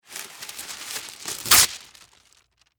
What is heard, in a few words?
Household Wax Paper Tear Sheet Off; Cleaning (Sound Effects:Household)